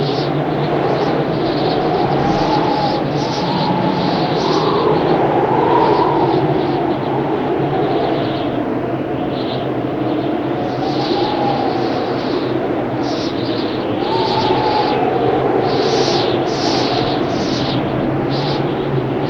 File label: Windy Loop